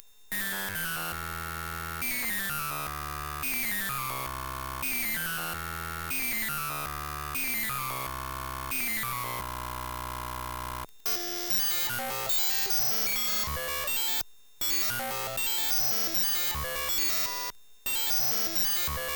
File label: spacey voice; apple2